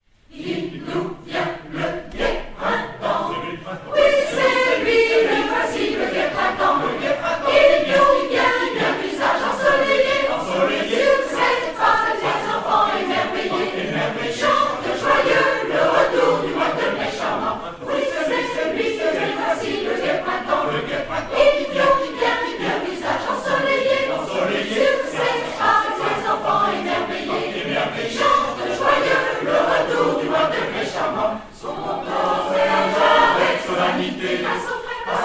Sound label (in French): La fanfare du printemps